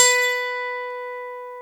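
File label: guitar B3; AKAI S-Series CD-ROM Sound Library VOL-8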